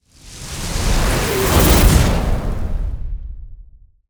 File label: fire cut